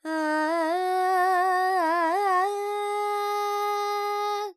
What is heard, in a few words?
Charukesi, Vocals, Carnatic Female, Alaps, G, Dry